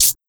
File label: HiHat Scratch